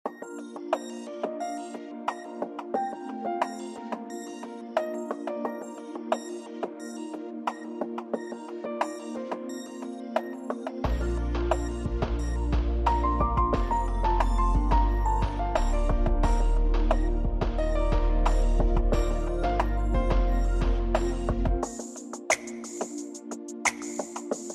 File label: instrumental